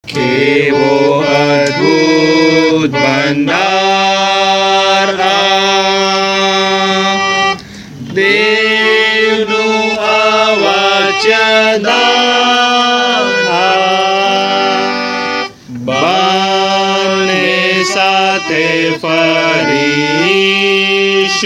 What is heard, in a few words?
Traditional Tune; 542 Kevo Adbut Bhandar Aa